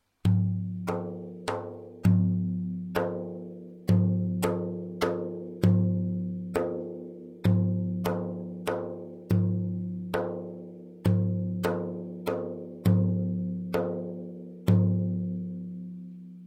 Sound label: tar